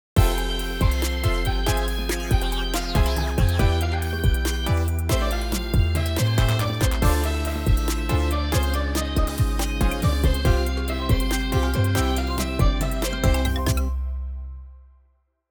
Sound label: thrumming guitar